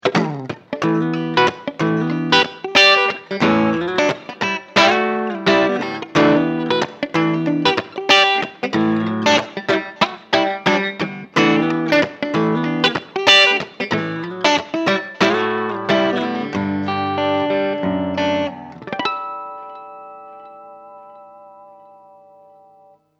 Clean

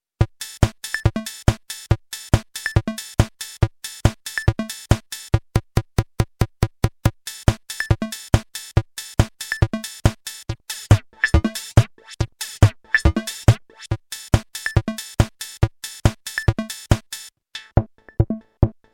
Casio XW PD1 Groove

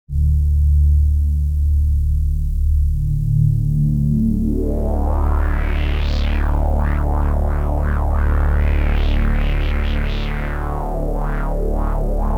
Pad korg Z1

